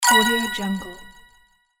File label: puzzle sound